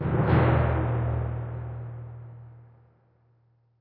Creep Tymp

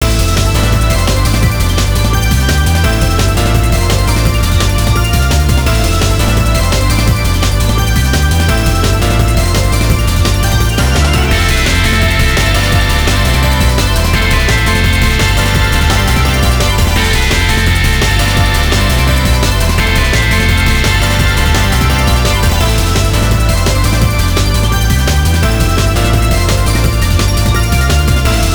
High Tension Battle; C-Drive. Sound Memories

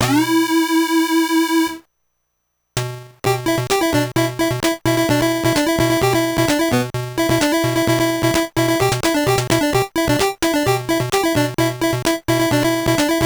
Character Selection Screen

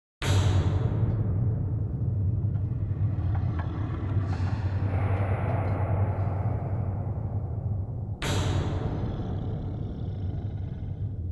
Underground & Screaming; Dark Sun Wake of the Ravager; ms dos

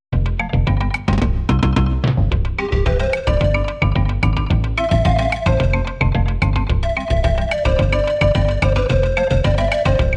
Bonus Level; Super Donkey Kong

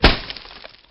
Shotgun Spray Impact; xbox 360; gamerip; castleminer z

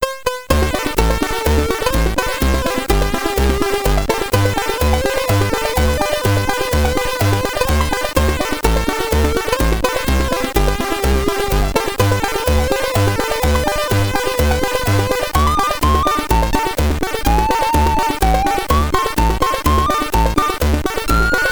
Target Shoot, commodore 64; Back to the Future III

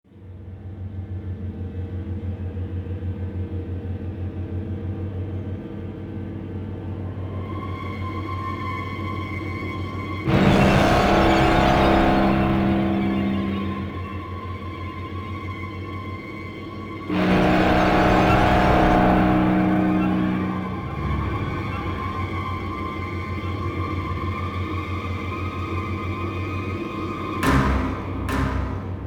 Night Tension; dying light gamerip 2015

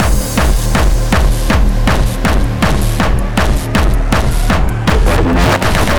Beats Loop; Anger Foot

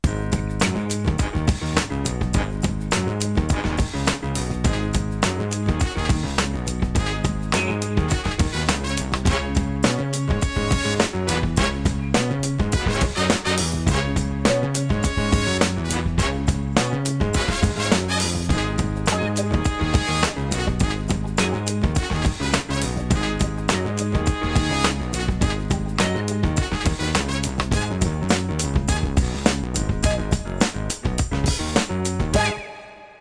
Funk, gamerip soundtrack; Backyard Hockey

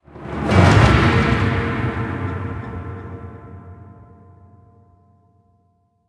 Defeat

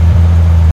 Vehicle Light Armored Run

